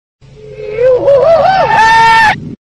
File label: goofy yell; reverse